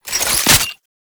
Scaldra Pistol Reload

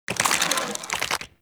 Draw, Weapons; Infested; Cernos

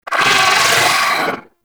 Vocal Death; Warframe; Moa Satyr

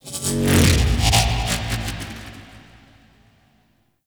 Tech Texture Med; Warframe; Ambience; Cephalon Weave

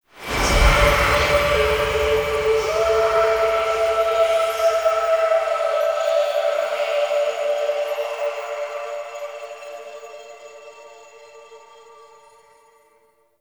Tailor Shop Door Portal; Warframe; Ambience; Nineteen Ninety Nine

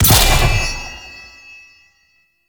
Charge Ability Cast; Enemies; Abilities; Warframe; Duviri; Dax Knight

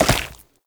Step Flesh, Foley; Warframe; Oraxia

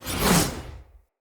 Knight Axe Whoosh; Weapons; Enemy; Axes; Snc Knight Axe

